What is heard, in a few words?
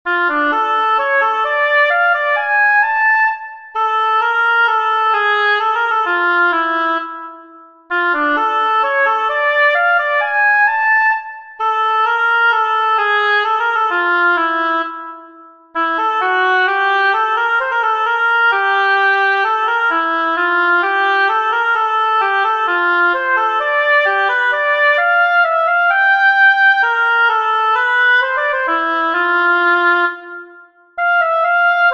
oboe; Johann Sebastian Bach; reia euch los bekra nkte sinnen